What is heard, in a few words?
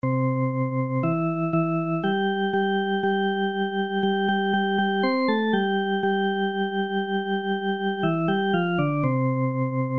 musical phrase without rests